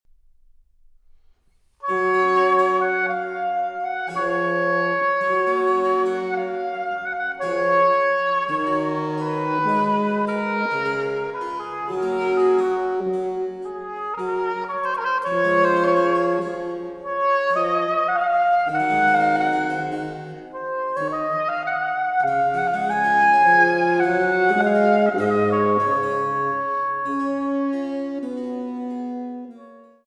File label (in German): Solo 11, e-Moll für Oboe & B. c.